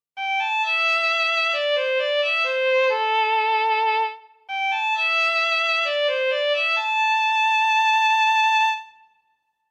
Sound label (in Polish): skrzypce; Nagrania Lokomotywy